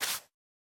刷子刷扫声

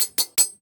锻造台使用声

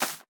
洞穴藤蔓破坏声; ambient

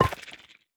幽匿催发体放置声

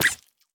美西螈受伤声

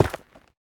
下界岩破坏声

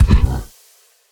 骆驼冲刺声

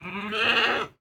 山羊冲撞前声

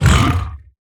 僵尸疣猪兽受伤声

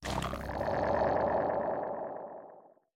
潮涌核心攻击声

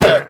羊驼受伤声

